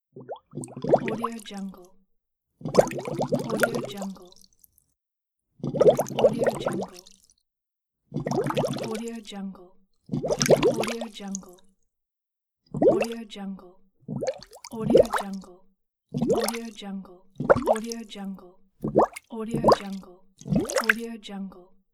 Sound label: bubbles